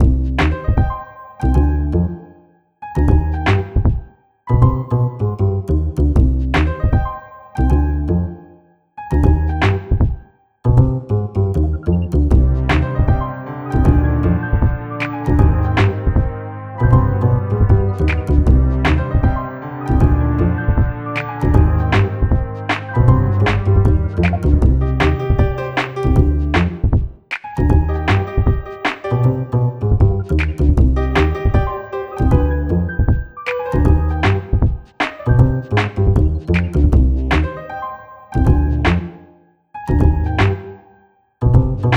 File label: 156 electro be bop 385; 432hz